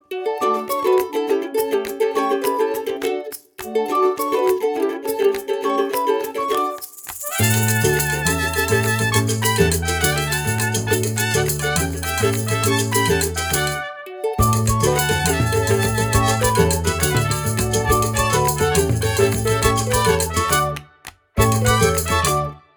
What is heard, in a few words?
Trumpet; Mexicant; Matthew Moore